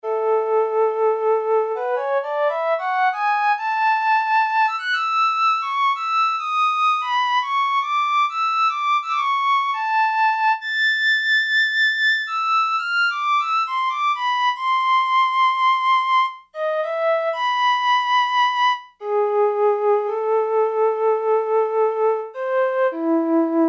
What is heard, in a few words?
Flute, Kawai K1m